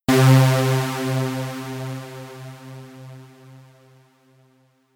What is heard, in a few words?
Synth Env Piano